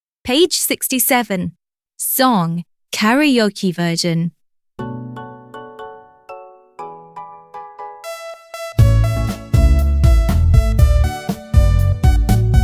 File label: song karaoke